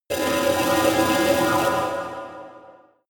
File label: alien construction machinery